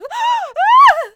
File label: Enemy Generic Human Death Eaten; rampage arcade gamerip 2018